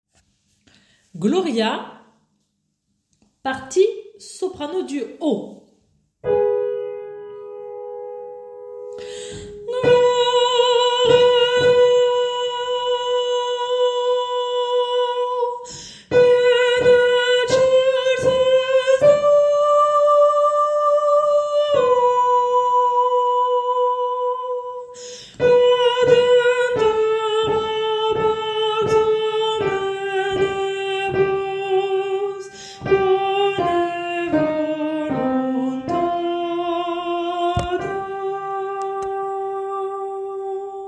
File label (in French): soprano haut; Gloria